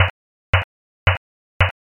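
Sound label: footsteps asphalt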